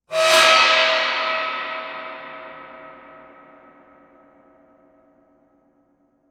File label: Cymbal MEINL 22 China Bowl Bowed; Coll Anderson; Cymbal Flash